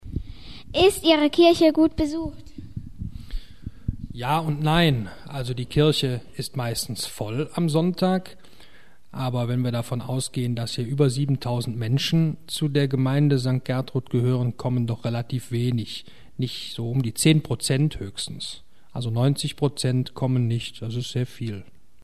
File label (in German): kirche voll; Ist Ihre Kirche gut besucht?; Interview; Kaplan; katholischen Kirchengemeinde Eller